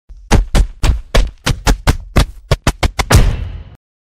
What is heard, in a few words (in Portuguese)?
som de soco